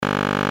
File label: buzz glitch; Multimedia; Blastwave